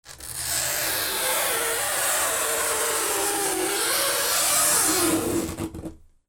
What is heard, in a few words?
FRICTION